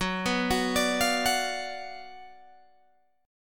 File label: Gb Major 7th Suspended 4th Sharp 5th, Guitar Open G